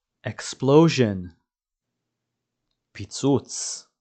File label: explosion; פיצוץ